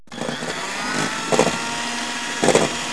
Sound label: hand mix; casa; objetos